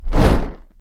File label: Torch Hit; SFX Folk; From Shadows